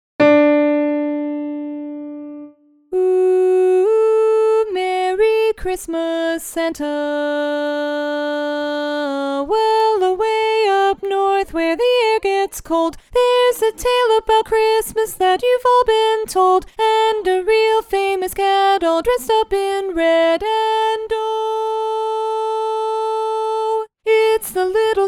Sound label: Lead Alone; holiday music